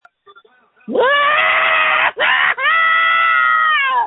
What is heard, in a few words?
screams